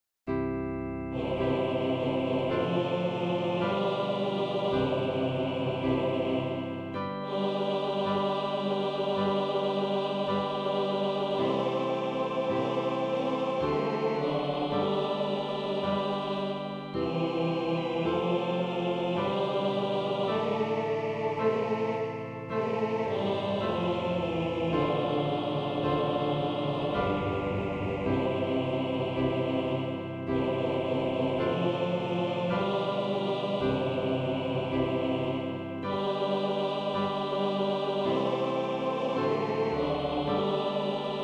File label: Gloria Basse; Messe de Verrieres; Gloria Ut M; Verset 2 et suivants